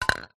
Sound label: industrial can wd40 set down floor